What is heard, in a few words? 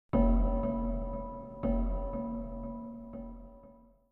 hits; Myst III Exile